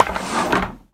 织布机拿取结果声

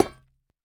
下界合金破坏声

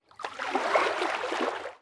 海豚游泳声

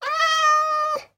猫流浪者待机声

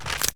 嘎枝解冻声

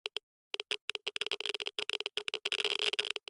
玄武岩三角洲咔嗒声; ambient

